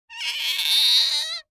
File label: Lever Squeak Slow; Cartoon